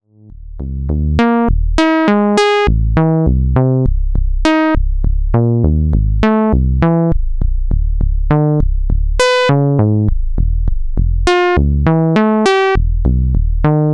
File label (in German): MOOG Mavis Beispielpatch; Who needs a sequencer